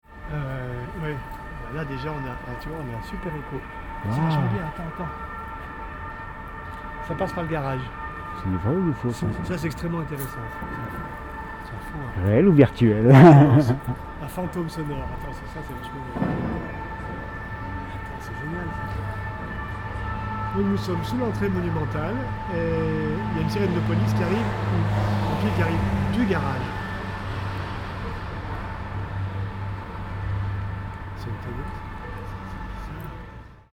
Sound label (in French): FX delocalisation